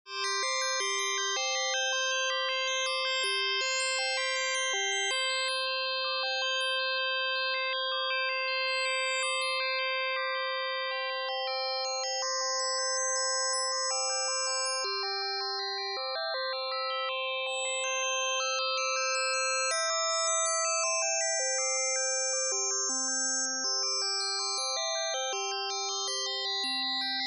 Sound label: Abstract dreams